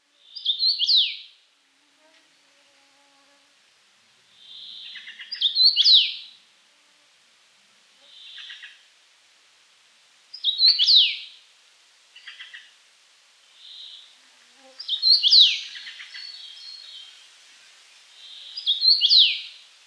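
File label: obyknovennaya chechevica golos, common rosefinch voice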